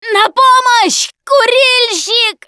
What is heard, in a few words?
choke, russian, Survivor Voice Producer